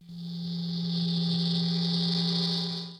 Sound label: prox out; Star Trek